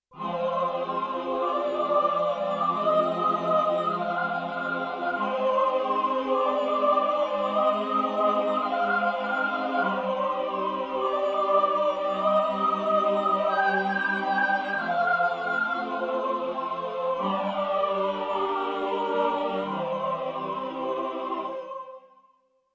STEREO, Choir, Yamaha TX16W

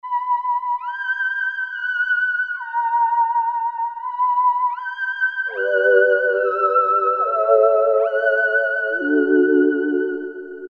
Pad Ghosty Voice, Alesis Micron; Factory Programs

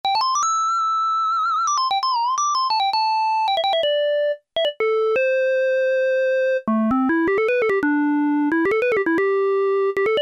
Flex Solo, Kawai K1m

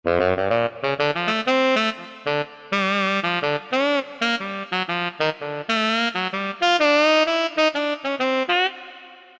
Tenor Sax, Korg M1; Factory preset examples

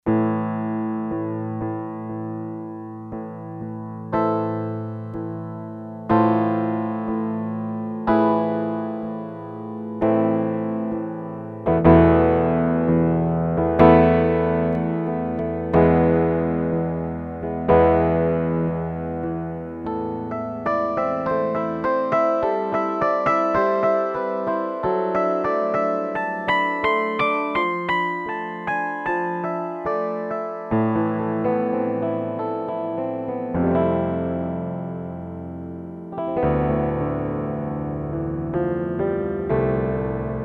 Grand Piano, Yamaha TX802; Performances; Factory Patches

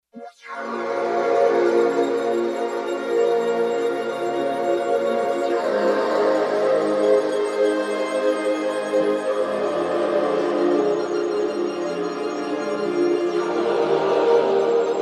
Mystery

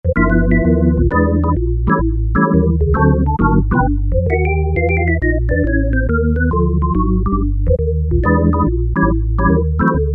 Lounge Organ, Korg MS2000; Factory Patches

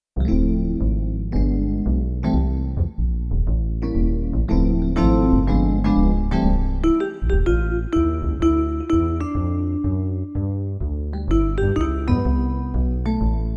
Roland D-70 Jazz Split; Factory Performances

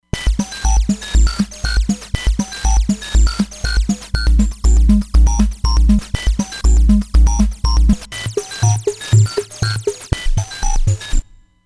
Radio Beats, Roland Fantom XR

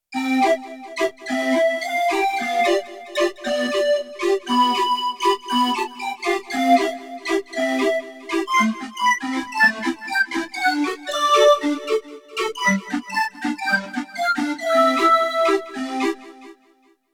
Calliopead, Roland D-70; Factory Performances

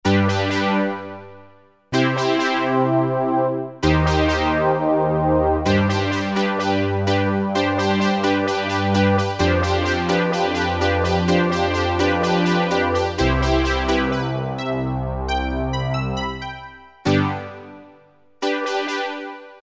Echoes; XG Voice examples

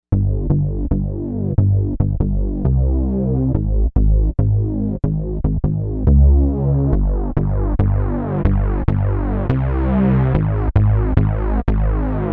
Detune Bass, Novation UltraNova; Factory Patches

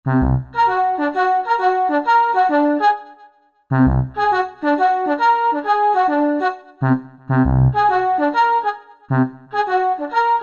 Jazz Mutes, Korg Wavestation A-D

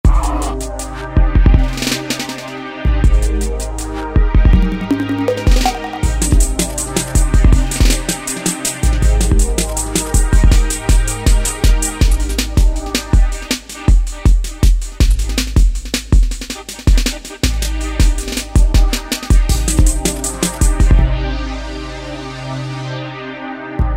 HardStep, Yamaha DJX Styles

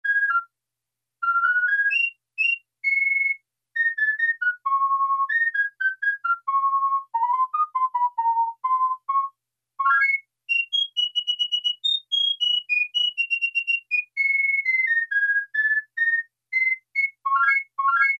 PICCOLO, Yamaha DX7

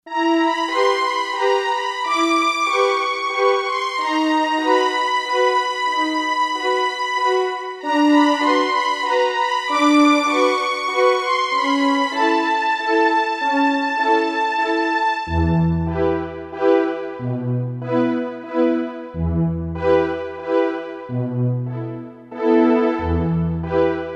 Strings; Orchestra 1

